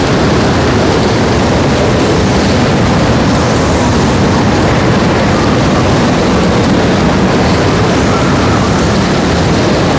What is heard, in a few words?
tornado; DAoC; Toa; Gamestar